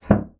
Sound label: bassoon slap; Xsample VOL-6; C BASSOON